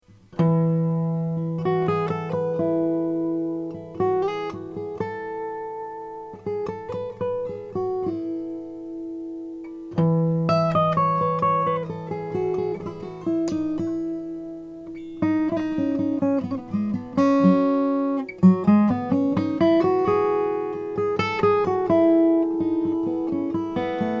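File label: Olson SJ koa acoustic guitar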